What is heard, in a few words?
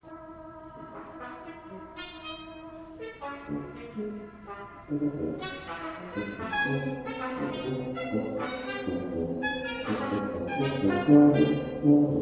Quintet